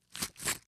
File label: money jara; 財布をジャラジャラ鳴らす音、小銭の音; 生活系の効果音